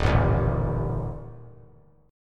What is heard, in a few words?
D sharp Major Flat 5th; strummed; D#Mb5; JGuitar